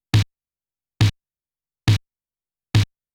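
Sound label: DRY snare drum, Techno 138 BPM; Best Service ProSamples vol.54; AKAI; CHICAGO 5 O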